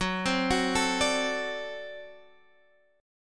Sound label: D 7th Gb, guitar